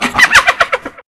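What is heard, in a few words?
hyena ambience snores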